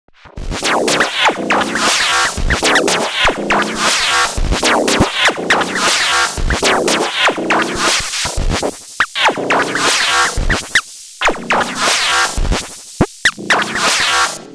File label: Wild Noise Beat; Erica Synths Perkons HD-01